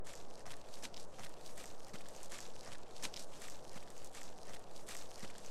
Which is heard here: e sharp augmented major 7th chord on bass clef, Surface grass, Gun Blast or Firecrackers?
Surface grass